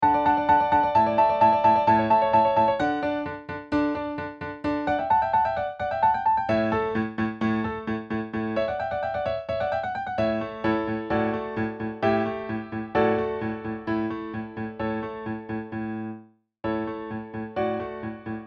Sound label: gloria in d major accompaniment; vivaldi